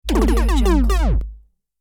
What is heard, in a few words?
technology ball rolling